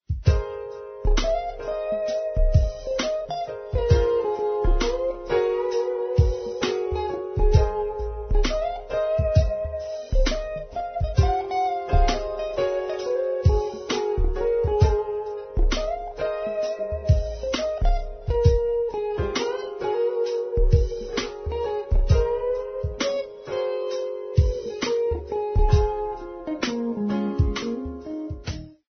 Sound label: jazz smooth